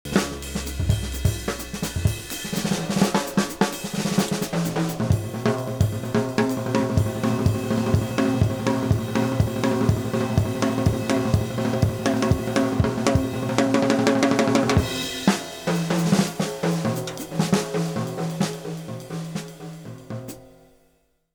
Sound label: Jazz Drum Solo Ratio 3to1; Th -6db A0.6; R 0.1 GR4-5dB